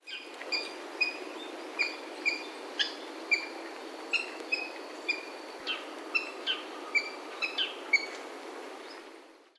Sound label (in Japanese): クイナ